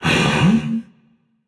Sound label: surprised, voicepack; smite; jorm